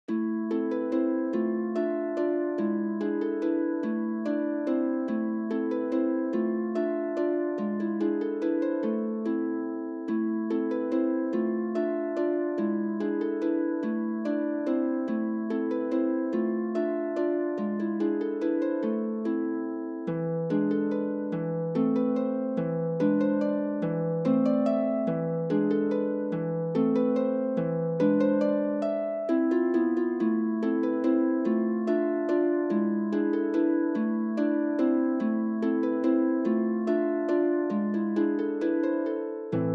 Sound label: le carrousel harp